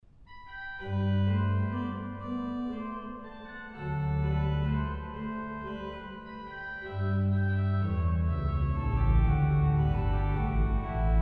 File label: Menuett G dur, orgel